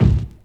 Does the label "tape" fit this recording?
No